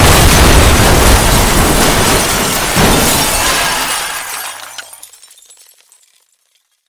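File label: furious strong ringing noise of breaking glass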